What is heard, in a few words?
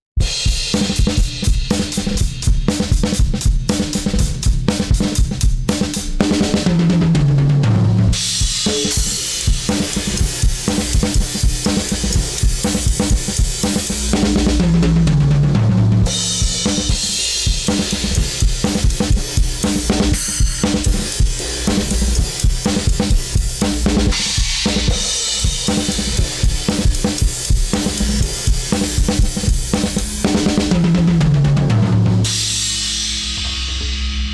drums; Autoformer